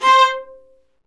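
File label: Marcato, Strings; Vlas Marcato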